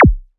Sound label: blipp, BASSDRUM; Drum Hits